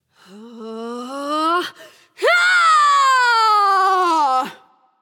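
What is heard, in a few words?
battlecry, female; smite; voicepack; hera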